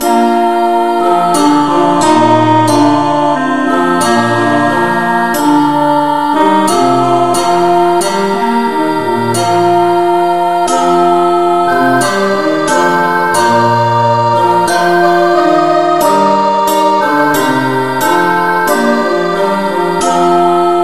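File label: Christe Sanctorum, no vocal, key B flat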